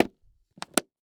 Emergency First Aid Kit Plastic Closing And Locking; emergency effects; AdobeCC